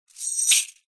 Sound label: foley keys large bunch prison guard catch hand